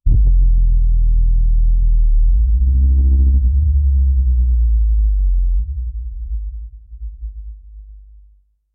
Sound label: bass super boosted, fart